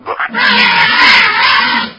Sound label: scream, poisoncrab; svencoop; hl2